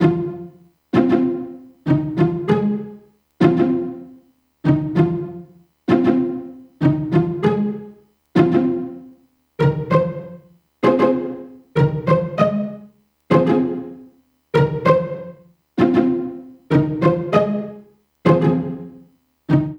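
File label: 097 West Coast Hip Hop Pizz F minor, Strings; Pro Tools Essential Loops; Digidesign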